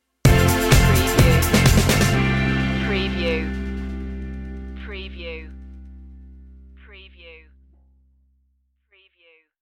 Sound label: stinger, Edgy, driving pop track, with big synth pads and angular g; electronica; electropop